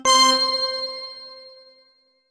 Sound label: start